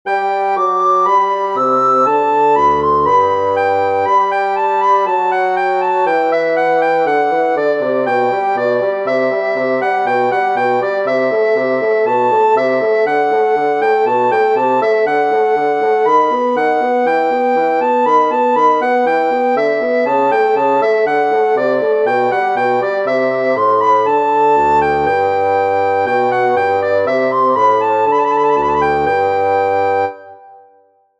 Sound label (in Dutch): vivace sopraan snel, blokfluit